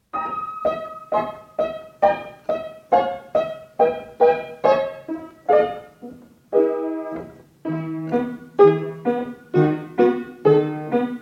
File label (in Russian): kap kap, fortepiano